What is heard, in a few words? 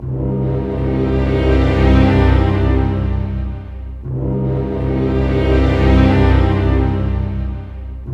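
60 dark, orchestra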